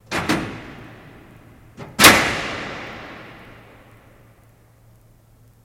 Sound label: Door Latch Hvy Duty; latches & bolts